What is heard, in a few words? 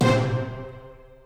UI Transform